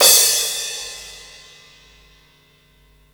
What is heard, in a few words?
CYMB